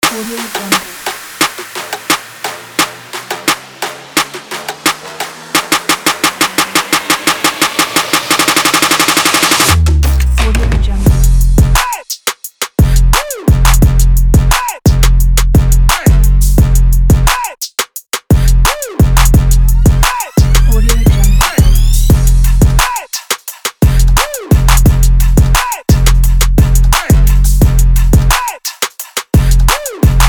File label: rap